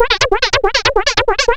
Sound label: A#, FX; Big Fish Audio